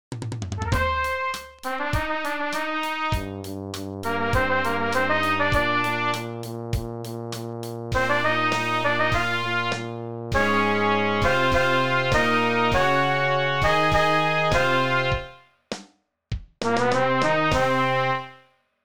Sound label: TUNE; De wereld draait door